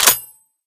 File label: rifle bolt open; Weapons; Guns